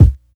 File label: clear steel kick drum sound b key, Royality free bass drum one shot tuned to the B note; kick drum samples